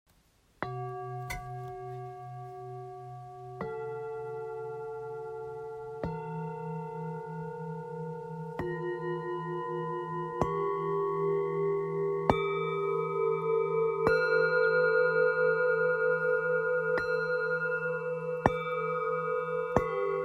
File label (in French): bols bengale eclair